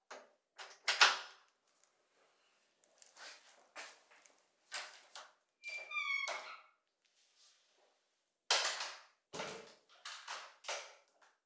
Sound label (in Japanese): ラブホのドアを開けて入る